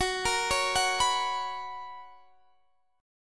B Major 7th Suspended 2nd, chord

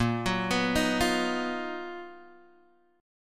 B Major Sharp 11th Bb

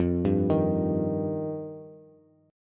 F 7th Suspended 2nd, Bass; arpeggiated; chord; F7sus2; x,8,5,8; JGuitar

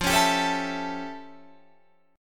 F Augmented Major 9th, Guitar, Open D

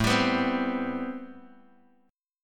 A-Minor 9th A Bass Standard; strummed; JGuitar

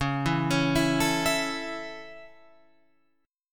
Db Minor Sharp 5th Db Guitar Open F; arpeggiated; Dbm#5; JGuitar

